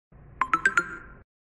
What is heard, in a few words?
Chocolate notification; اعلان